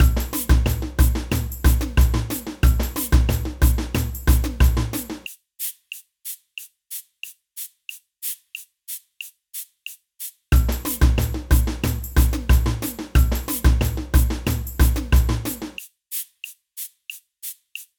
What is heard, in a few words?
ganza, samba; samba band